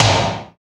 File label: RAGGA; AMG - Now CD-ROM (Roland); Soun'Bwoy!; Ragga Samples